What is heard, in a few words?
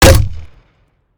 m79 shoot; tacz; daffas arsenal